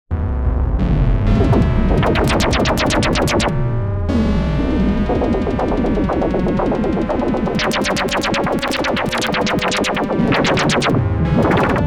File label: Wobbly Analog SFX